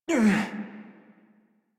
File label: Pain Adult; Warframe; New War; Adult Operator